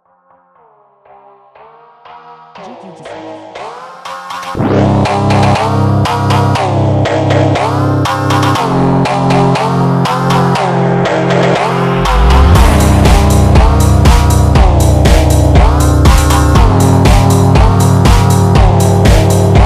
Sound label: phonk